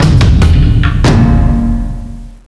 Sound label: drums; svencoop; sc mazing